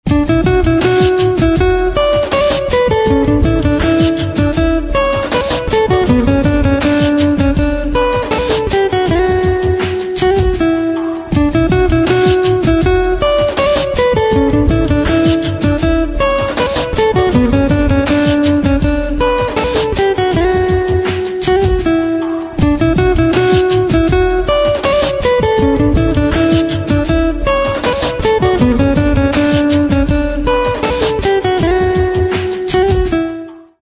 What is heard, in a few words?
Guitar Music Ringtone